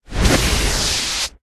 Pet Elemental Fire

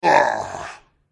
dark prince wound

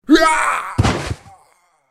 die

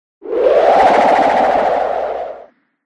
pet bear buff; bear4

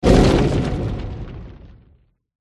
defender wound

